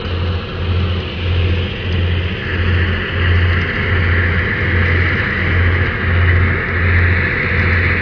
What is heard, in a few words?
nautical